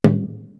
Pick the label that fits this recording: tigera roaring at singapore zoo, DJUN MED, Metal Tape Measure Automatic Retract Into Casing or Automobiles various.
DJUN MED